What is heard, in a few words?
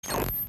Zap, TV